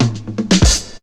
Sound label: house loop